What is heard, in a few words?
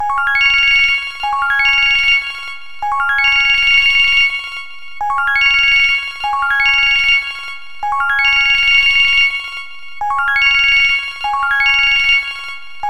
high pitch phone ringing tone